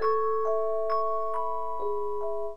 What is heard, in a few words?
Xmas Kiss Critical Stop; CHRISTMASKISS